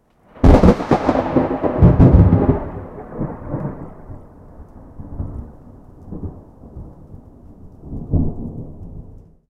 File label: bang